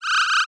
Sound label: sword fish attack